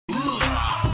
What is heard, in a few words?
HOO-UGH